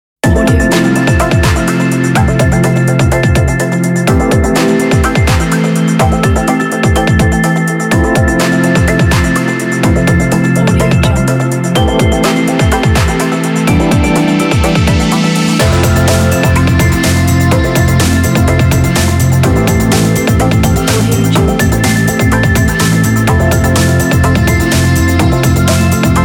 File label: ambient atmospheric breakbeat technology; nicebeats